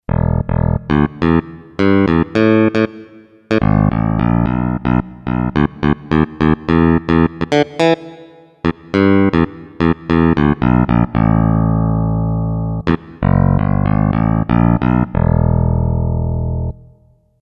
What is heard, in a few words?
Picked Bass, Roland SC-880; Factory Tones